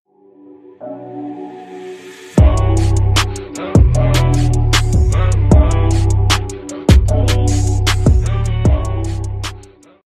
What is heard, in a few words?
follow sound alert sound and donation sound; twitch